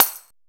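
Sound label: tambourine hit; Zero-G Ambient 1; PERCUSSION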